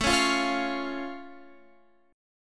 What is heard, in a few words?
A Augmented A down Guitar Half Step Down